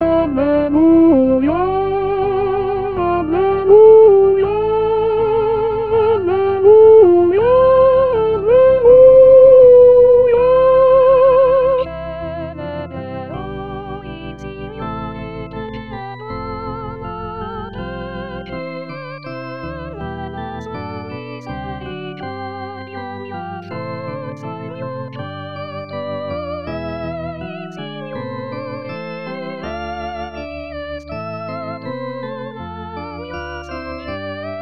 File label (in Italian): Cantico Dell Agnello Bassi; anno liturgico